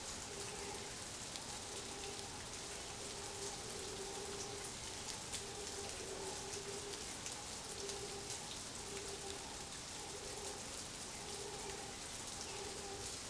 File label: amb swamp Rain; Star Wars Obi Wan; XBOX